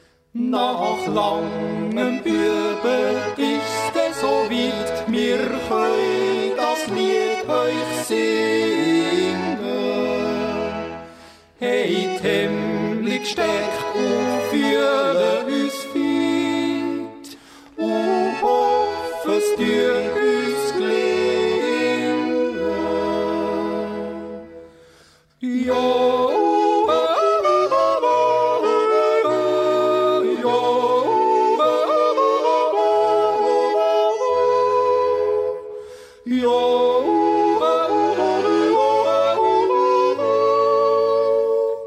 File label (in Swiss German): Dr Chnöischlotter (Duett)